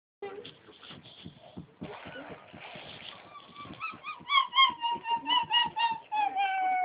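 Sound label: baby hungry, female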